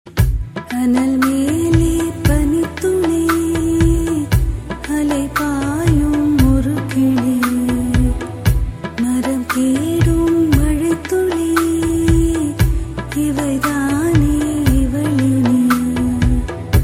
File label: whistle